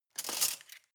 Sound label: cutlery large multiple drawer grab